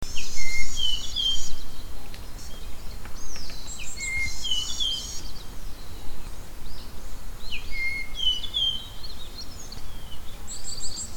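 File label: Japanese grosbeak; ikaru; kigoyama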